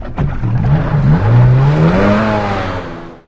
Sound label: jetski start; hl2